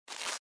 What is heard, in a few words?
sound right short